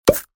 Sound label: monster unclick